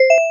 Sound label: correct